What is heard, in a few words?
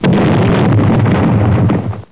boom